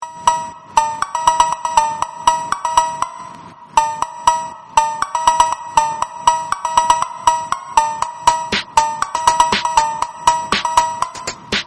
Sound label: yoga instrumental music